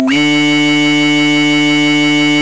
weeeee; v1 ultrakill

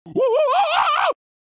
yellow larva scream

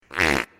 squeak

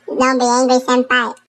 angry

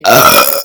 burp

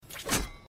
clang; iron man helmet

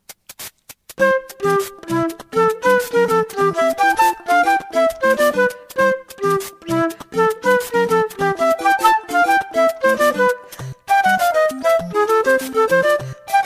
theme flute cover; super mario bros

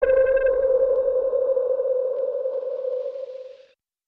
sonar

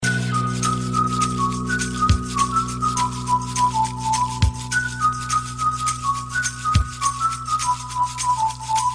bangles whistle

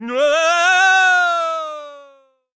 banjo

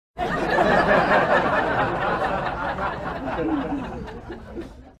classic audience laugh background sound

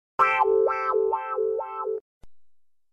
awkward synthesizer

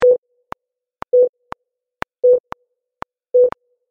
ekg

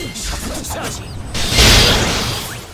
charge; elsword; shaft

